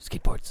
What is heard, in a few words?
skateboards